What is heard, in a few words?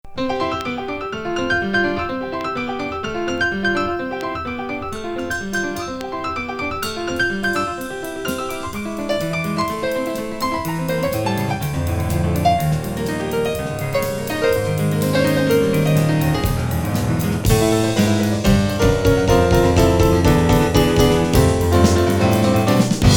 piano and drums; childrens concert; Polydor